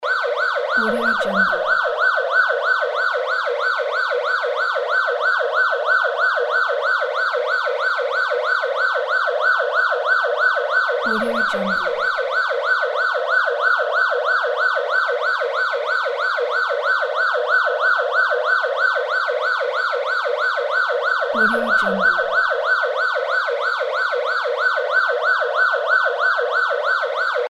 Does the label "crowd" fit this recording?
No